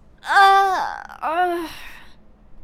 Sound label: Death, human male evil; customnpcs